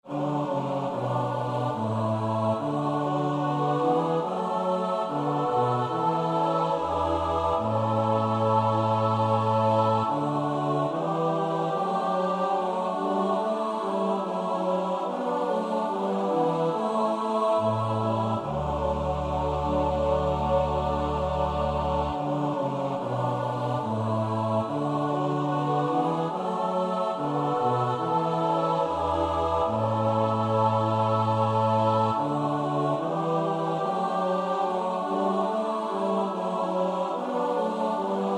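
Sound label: soprano alto tenor bass; Bach (Johann Sebastiaan); BWV 011; 06.Nun lieget Alles unter dir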